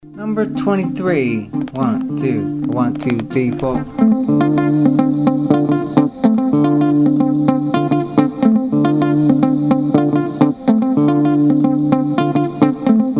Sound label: mozambique patterns